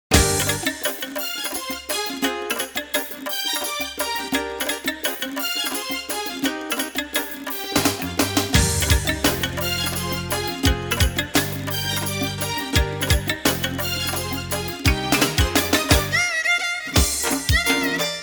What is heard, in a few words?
Instrumental